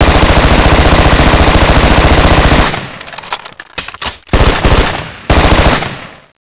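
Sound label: machine guns; firearms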